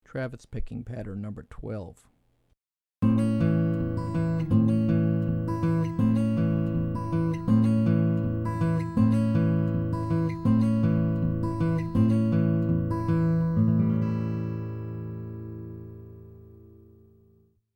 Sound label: Travis Patterns; travis style lesson materials